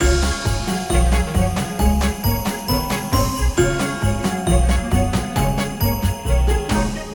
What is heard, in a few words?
Big Win Music Tally Loop; Mahi Gaming; holly Jolly Penguins